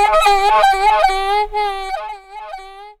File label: SAX RIF; E-Lab; X Static Goldmine 2; akai